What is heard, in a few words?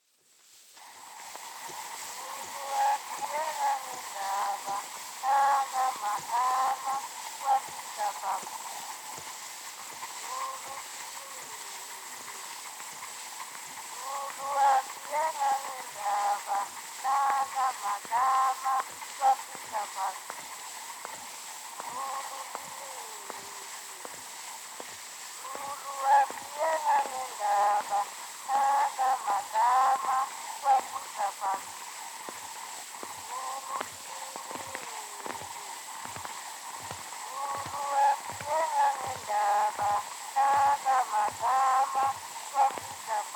Zulu recordings; Father Franz Mayr